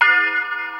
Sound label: bell; E-MU Formula 4000 Series Vol. 1; Hip Hop Nation; Bonus Perk